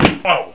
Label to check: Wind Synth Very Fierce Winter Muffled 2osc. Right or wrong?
Wrong